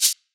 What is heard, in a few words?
shaker, drums